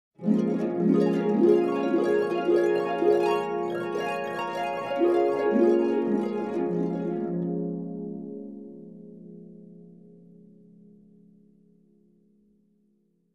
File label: Many short dream harp up down glissandos